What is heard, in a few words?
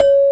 CELESTE; cubic